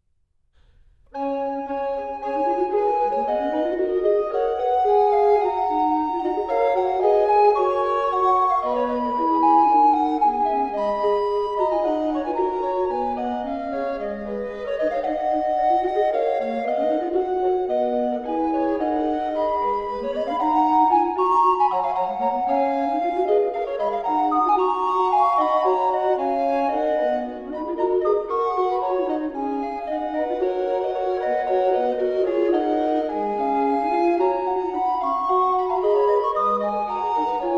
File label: Recorder Quartet; Ich armer Mann So man lang macht; Ludwig Senfl